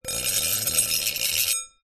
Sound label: foley metal small dish drag scrape concrete